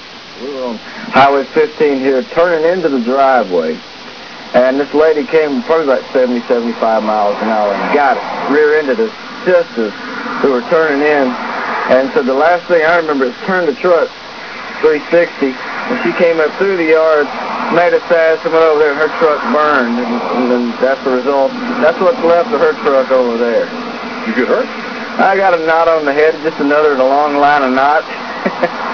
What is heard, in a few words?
car accident